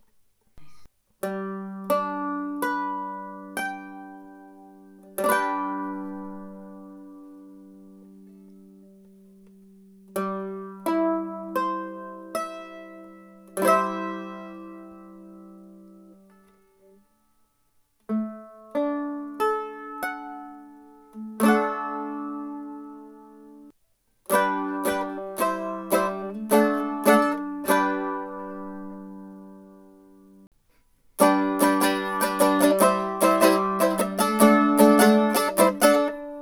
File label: mando